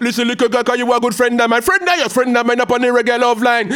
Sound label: ragga in B phrase